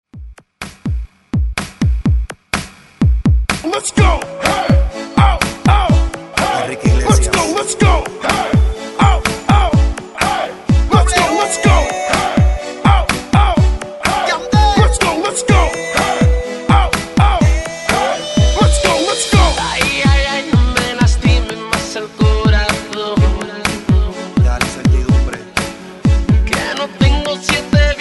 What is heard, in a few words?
Hype ReDrum 125Bpm; DJDOLLS